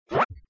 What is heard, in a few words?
Folder Opened; ESAN VO Sound Schemes; ESAN VO Sounds Pack